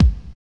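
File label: HSE-BD, house bass drum; X-Static Goldmine 1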